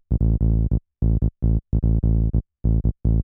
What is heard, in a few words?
303 Bass